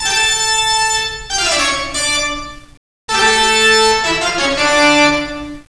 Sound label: Toccata; Instrmnt; Sound Library 2000; Wayzata Technology